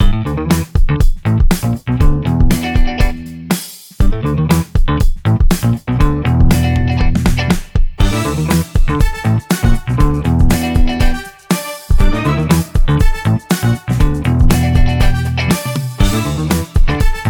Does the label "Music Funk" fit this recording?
Yes